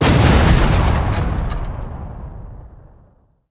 explosion vehicle; Emperor - Battle for Dune

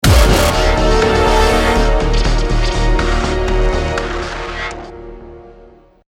deathcam, music; Counter-Strike Global Offensive

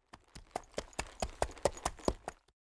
Horse; Age of Empires III; Main Horse

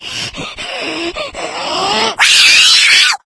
witch before kill; npc; voice; attack; Left 4 Dead 2

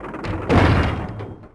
Drawbridge; Sid Meier's Civilization 4 Complete; Warlords; Units

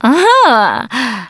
positive noise, russian, Survivor Voice Producer

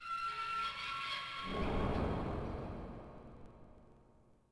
Space Crate Fall; Ambient; Sid Meier's Civilization 4 Complete; Beyond the Sword

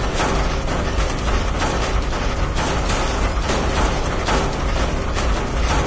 factory work; Tank

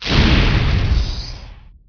flame infantry; Emperor - Battle for Dune

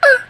lil peanut hurt; Left 4 Dead 2

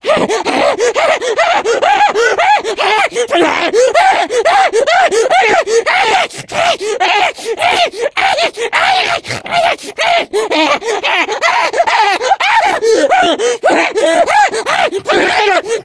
jockey attack loop, voice; Left 4 Dead 2

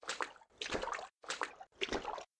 water crouch; AssaultCube

